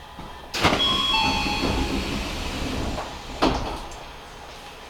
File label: chime open; Odakyu 2158